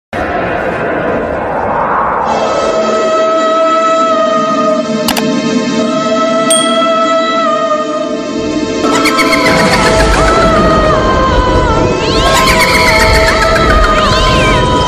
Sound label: horror ringtone